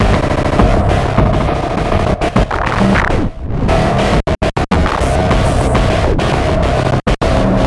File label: Error